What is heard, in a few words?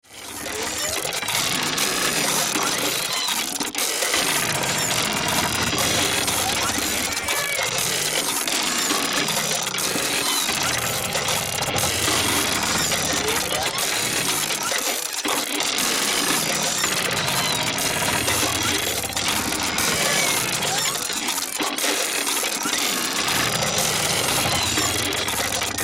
Sci Fi Workshop Machines Mechanical Steampunk; Tech